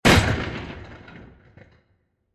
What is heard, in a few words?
Massive Impact With Various Particles; Burst; Impacts; CraftedSignals